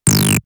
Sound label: blaster; 0.5 s; The Death Star